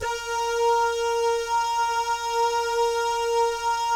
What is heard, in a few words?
DAH, Vocal Jazz; ILIO